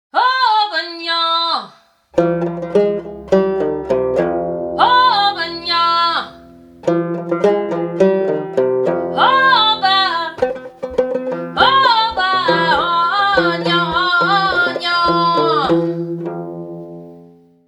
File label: voice fretless banjo